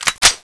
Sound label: bolt pull; cso; x-15